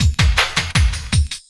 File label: Loop, Drum & Bass, 160 BPM